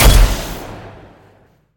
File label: weapon; MX-12